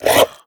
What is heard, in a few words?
breath in, headcrab black; creatures